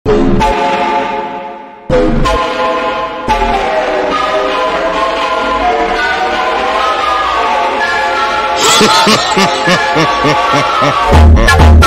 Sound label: brazilian phonk